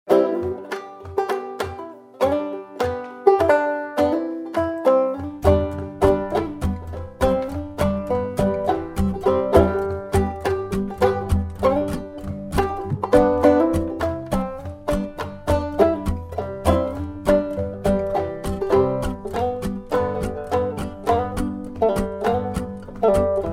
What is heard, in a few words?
Hardwood Scuff, banjo; instrumentals for banjo